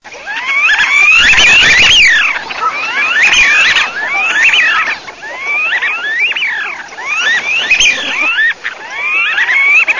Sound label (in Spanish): OVERO